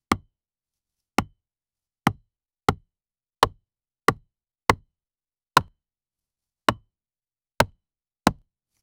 wood axe chopping into oa; tool

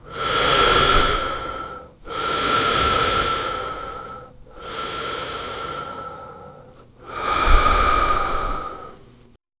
heavy breathing, anxious

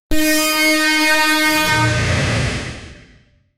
viking warn horn 5 second

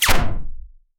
futuristic sci fi gravity gun

